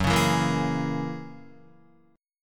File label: Gb 9th Gb down Guitar 4E,4A,5D,5G,5C,6F; strummed; JGuitar